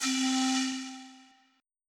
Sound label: fog